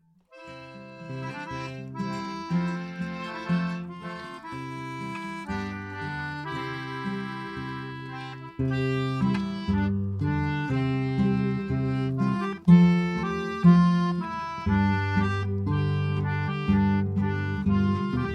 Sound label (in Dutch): delen karaoke; musical de goede bakker